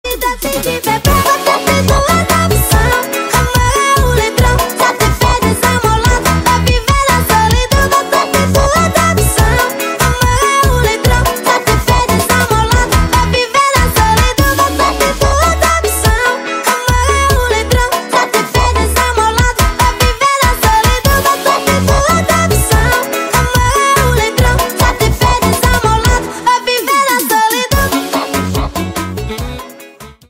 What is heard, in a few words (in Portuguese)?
Brega Funk